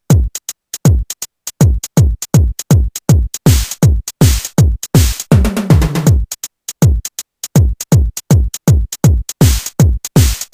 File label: drumsolo